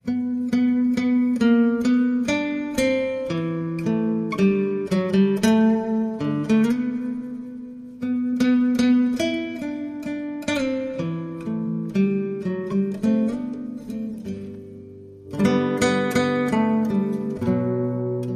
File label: O Little Town Guitar; Trad (PD)